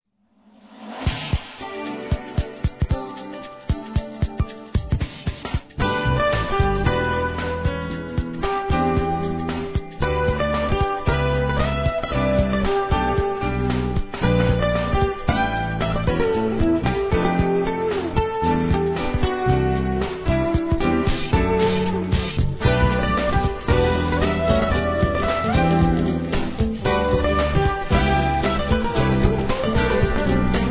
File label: Contemporary Grooves